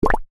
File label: bubble pop